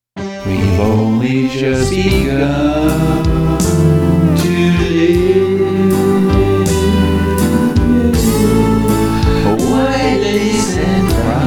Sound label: sing